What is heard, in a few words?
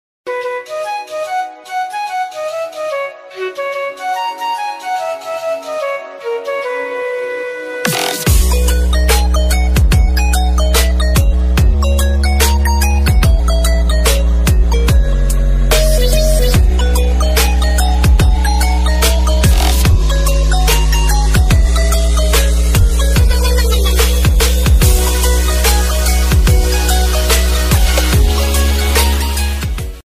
ringtone remix instrumental ringtone cartoon ringtone